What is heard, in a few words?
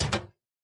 walk